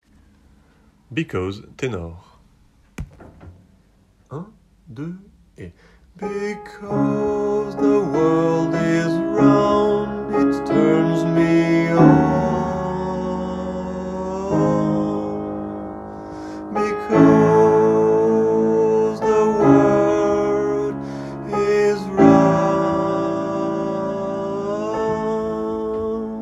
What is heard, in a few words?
Tenors